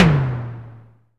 big tom drum one shot a sharp key, tuned to the A# note